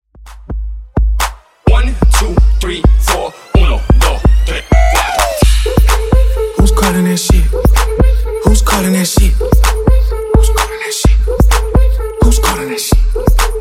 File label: Dj Intro Outro 128Bpm